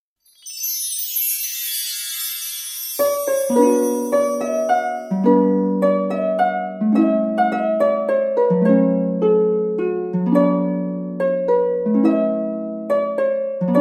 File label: instrumental; von schneeflocken und eiskristallen